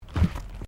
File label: Footsteps Stairs Wooden Carpeted Step; Crocs; Footstep Foley; Human